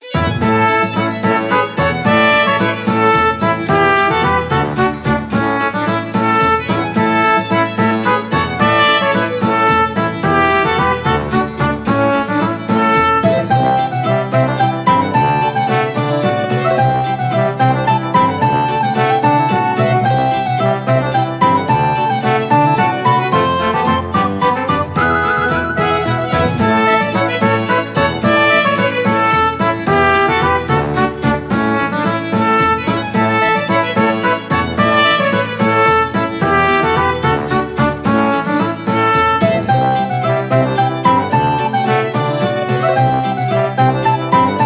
Harvest Festival loop, BGM

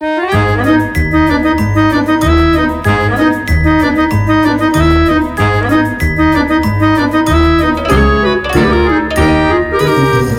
Nook Nights loop, BGM

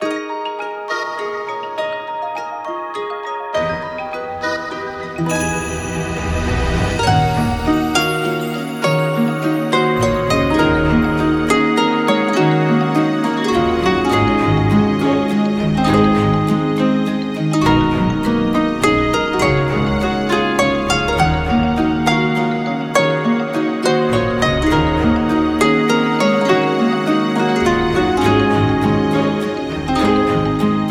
loop; Forest Sage

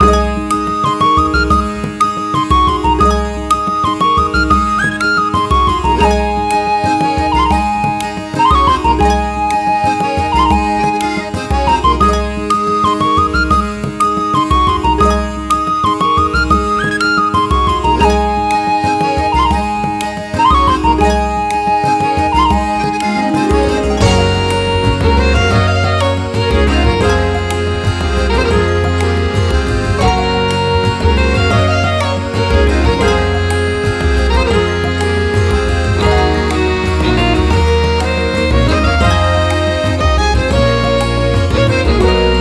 Village Fete loop, BGM

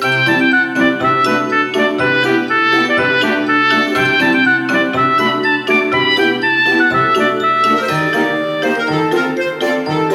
Enjoyable loop, BGM

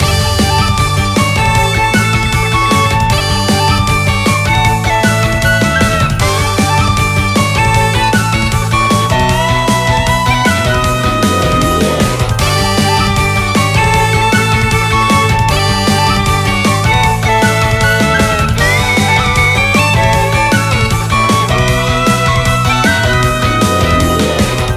Celtic loop

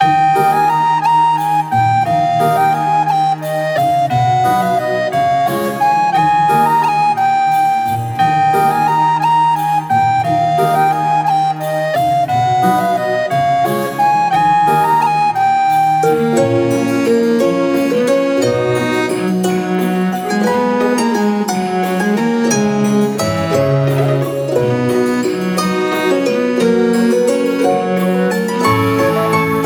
loop

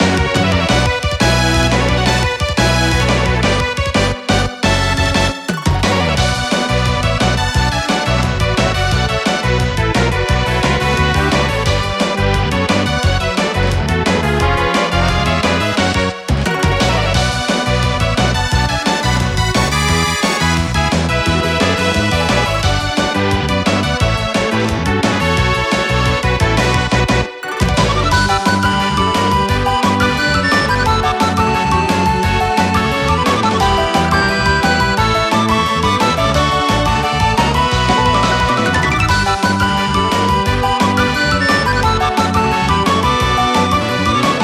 Retro RPG Battle loop

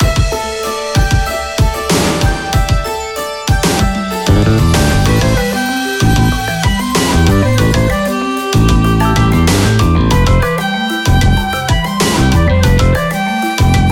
Dual Illusions loop, BGM

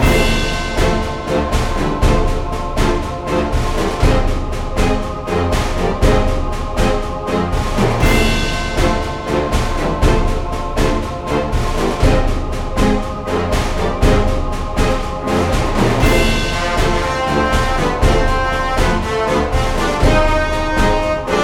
Strategy loop, BGM

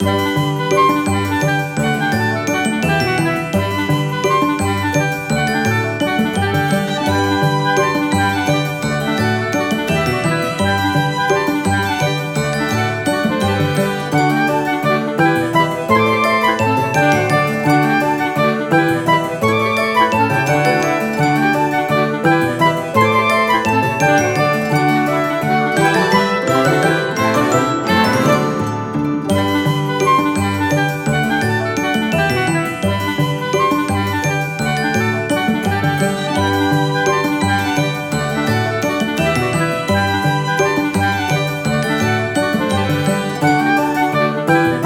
Afternoon Garden loop, BGM

